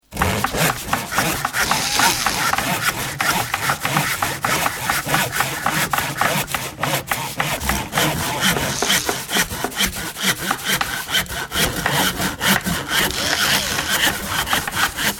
Workshop, Busy sound of various tools in a construction workshop.; Backgrounds